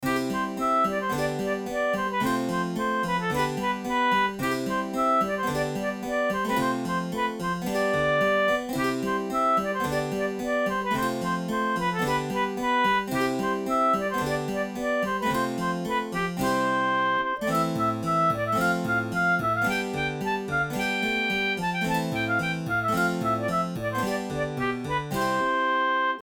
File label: Light Music; Light BGM; for a video game from the 2012 Global Game Jam